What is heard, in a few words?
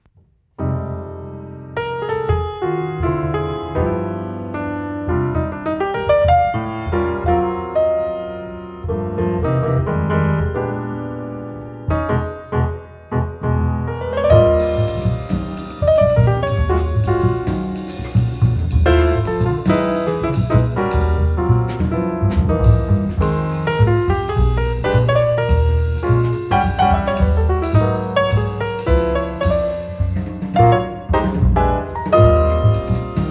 trio piano